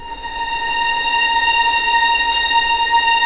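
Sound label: A#5 legato violin, right, The Ultimate String Collection; East West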